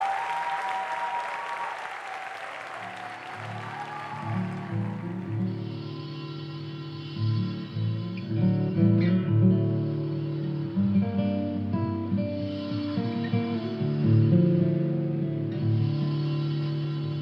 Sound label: Duet for Guitars